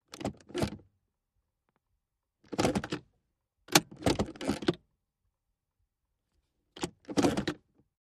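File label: Montero Gear Shift, 1998 mitsubishi montero int.; VEHICLES MITSUBISHI MONTERO: INT: Gear shift movement.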